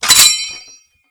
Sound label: An M1 Garand Clip Being Ejected W Ping